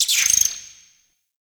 Interior Spider Chatter; Ambience; Warframe; Duviri; Murmur

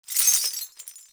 Movement Dash Chains; Warframe; Uriel

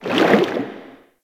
Ocean Shark Tail Move Swim; Warframe; Grineer

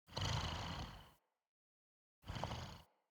Wolf Cub Sleep Idle Breathing; Vocals; Orengal Wolf Cub; Animals

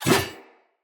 Sphere Dual Sword Whoosh; Weapons; Player; Swords

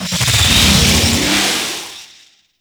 Sentient Vessel Movement; Warframe; Tau

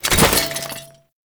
Cannister Throw Prep; Enemies; Abilities; Warframe; Tau; Dax Archer

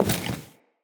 Walk Default, Footsteps; Enemy; Lady Deora

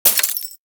Bullet Impact Debris Glass

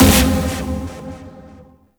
EDM; Warframe; Warframes; Bard; Bard Instrument Packs

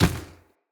Infested Medium Run Dirt, Footsteps

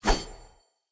Dagger Whoosh; Weapons; Swords; Fey

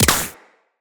Shield Impact; Tenno

